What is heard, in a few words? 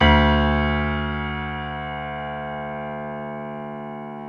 PNO XYPNO, Grand Pno, Roland; Club-50 - Foundations; Grand Pno 2